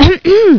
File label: COUGH FEM